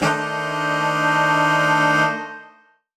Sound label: Horn Swell Alt Aminb5; undercover samples